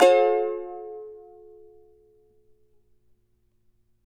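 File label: charango G minor up; Spectrasonics; Hans Zimmer Guitars VOL-2; CHARANGO CHD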